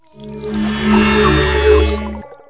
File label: Heal